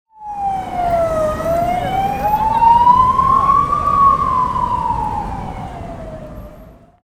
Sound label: ambulance passing through city traffic